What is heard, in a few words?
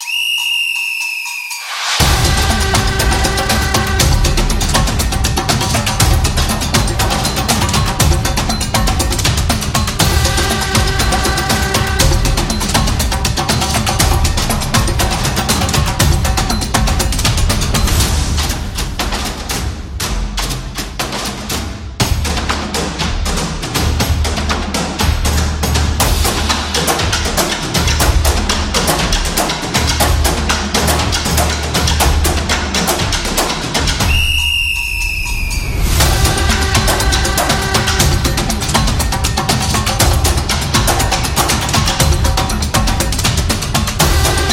Argentinian